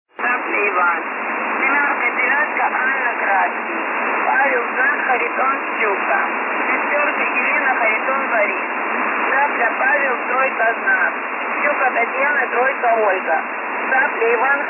The Pip Message 3.840 kHz, numbers stations

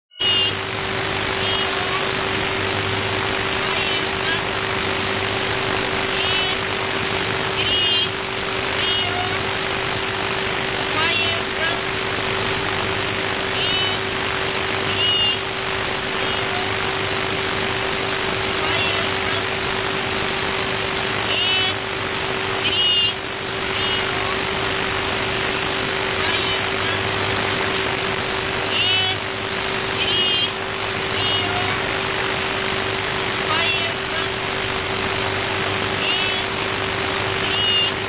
9.400MHz

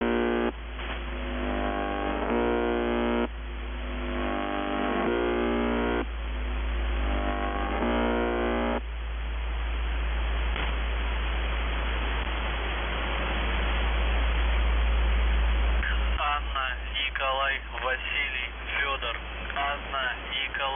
buzzer, numbers stations

